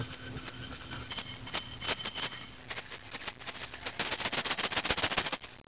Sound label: meinl spark shakers